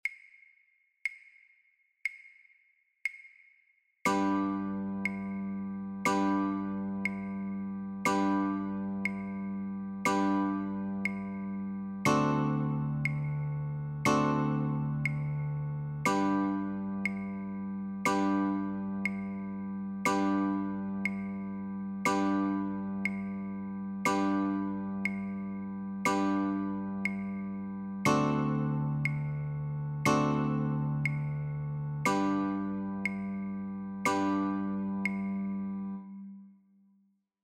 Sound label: London Bridge Chords Only, guitar; childrens